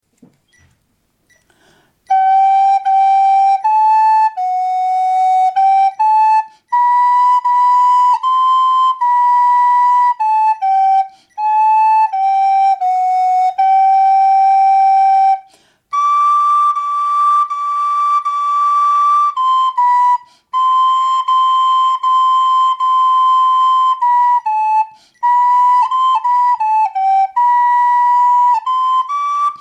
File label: flute